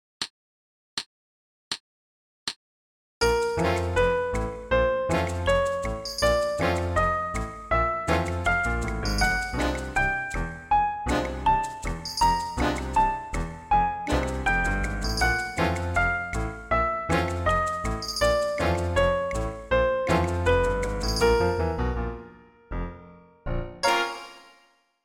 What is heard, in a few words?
Chromatic Scale Quarter Notes